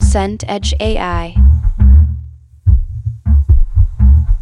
horror heartbeat fast

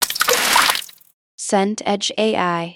splash small

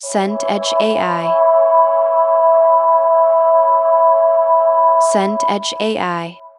drone choir

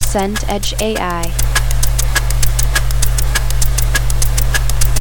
server fan spinup

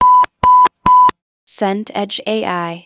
ui battery low

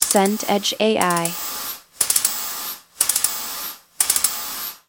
rowing machine

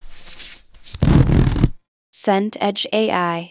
cloth rustle

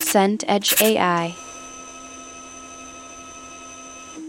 printer dot matrix

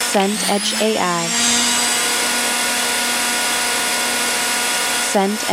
orbital sander

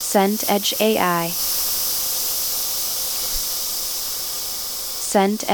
amb hotel lobby

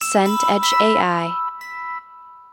logo chime

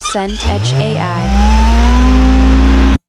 tape start

